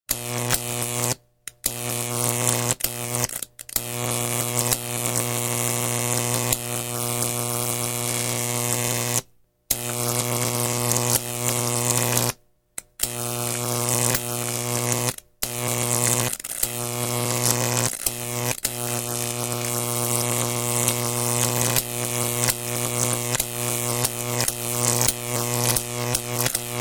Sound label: Звуки Электричества The Sound Of Electricity